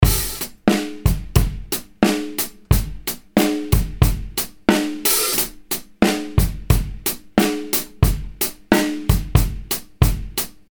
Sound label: 95 bpm westcoast, drum loop